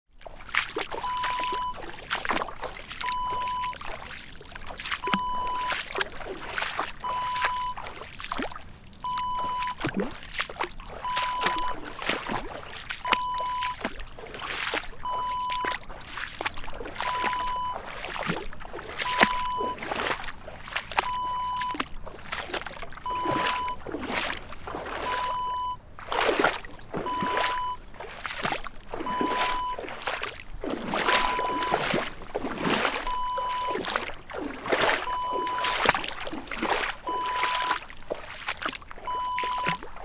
footsteps in water

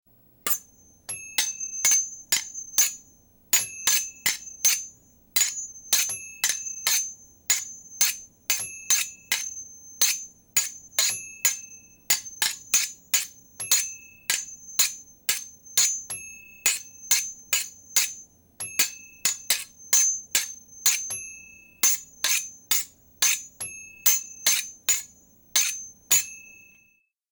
fencing

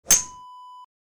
golf ball hit

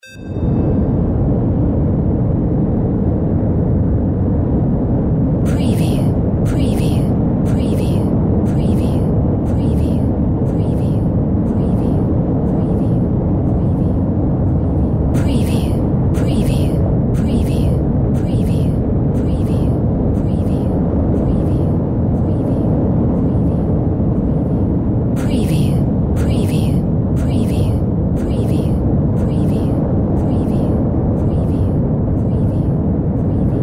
AMB UNDERWATER DRONE

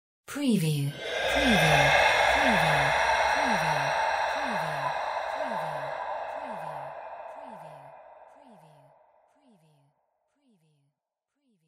sci fi whispers spooky hwaah; windy beach audio

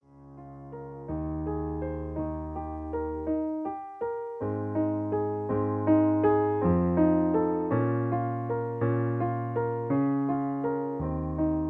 accompaniment

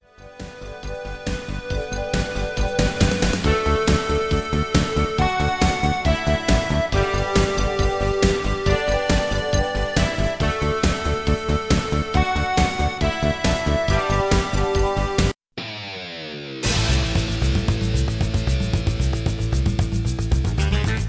FAST BG

